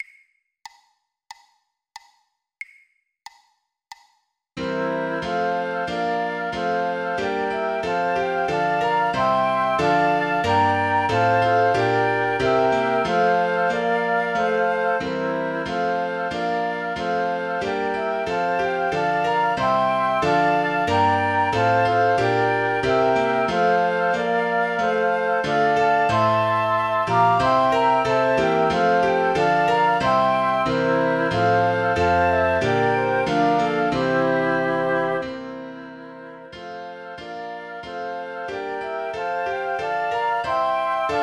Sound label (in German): Flöte Klavier; 92 bpm, 2x; O Little Town of Bethlehem; weihnachten